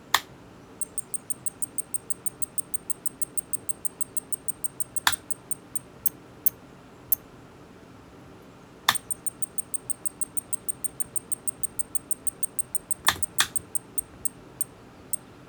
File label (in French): bip minitel panne